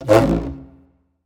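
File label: drag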